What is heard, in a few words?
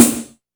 KORG 01W KIT; Rubber Chicken Software Sample Bank